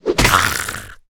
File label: zombie whit; DeadFright